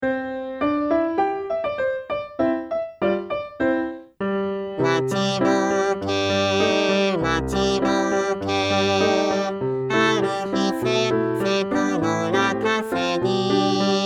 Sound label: male